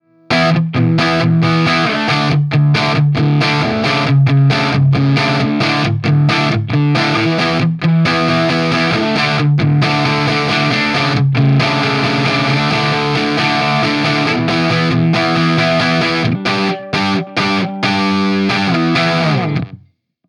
DIRTY Greenbacks; TC15; Marshall

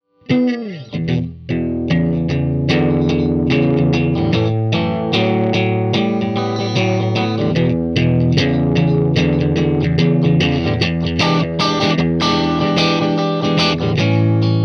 TRIWATT 50s Strat Neck Middle Fanes; trinity; Phase Inverter Tube - 12AX7

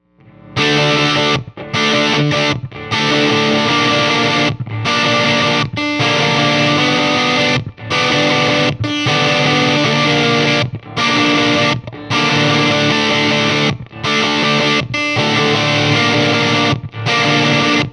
TRIWATT 12AT7 OD P90 Bridge Fanes; trinity

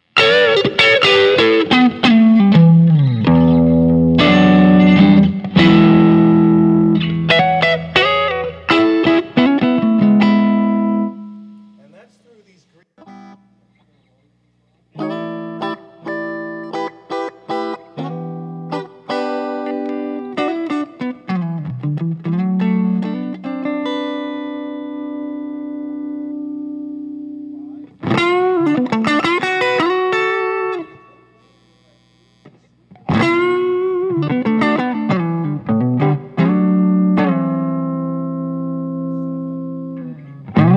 Strat

